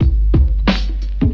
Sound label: heavy kicks, Drum loops Funky